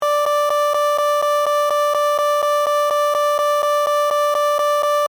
Alarm Spaceship, Scifi, Alarm, Spaceship, Console, Science, Fiction; Tech; Blast Wave